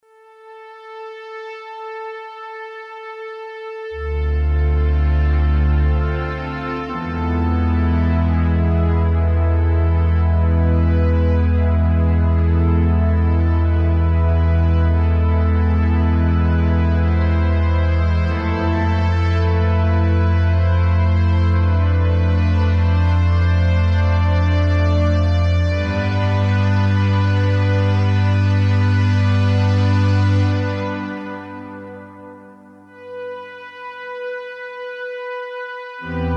memorymoog adagio

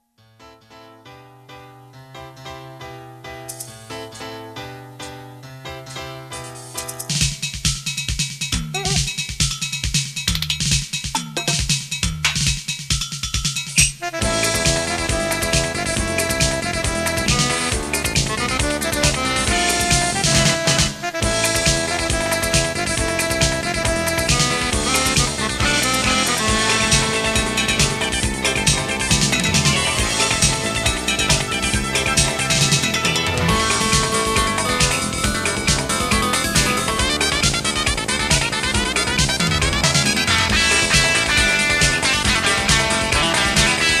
korg dss1

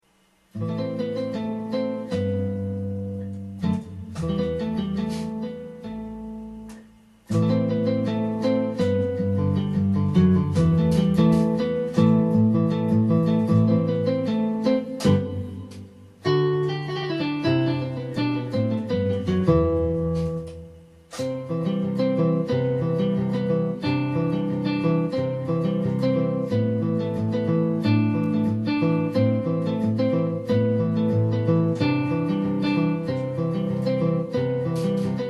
kurzweil 250 guitare flute

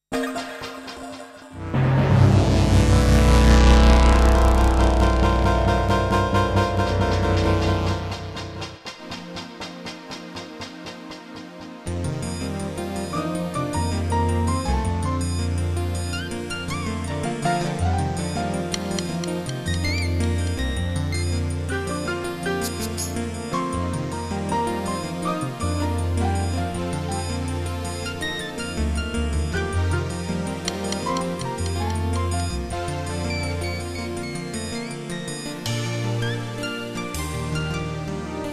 yamaha sy22

